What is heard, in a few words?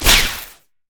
wildcards; LoL; twisted fate